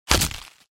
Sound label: bullet impact flesh